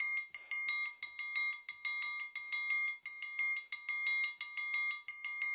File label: percussion loop; InVision Interactive; Percussion Slam; BREEZE